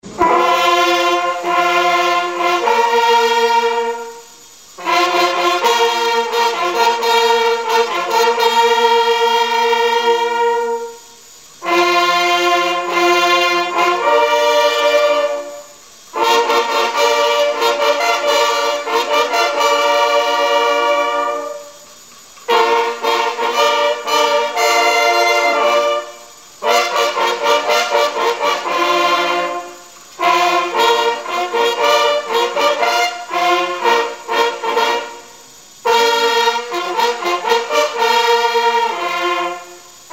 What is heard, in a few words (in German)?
jagdhornblaeser